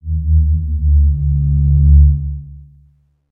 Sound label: rnd dark, ambient outdoor